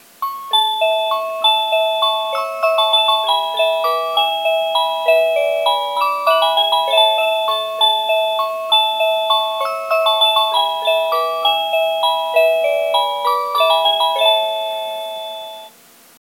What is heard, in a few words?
Cuckoo Tune